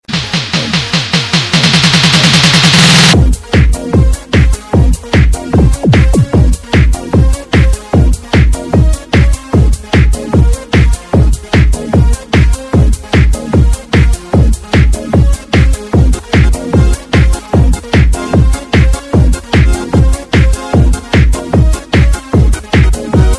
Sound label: atomic rave techno